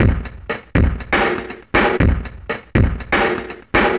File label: Beat, Industrial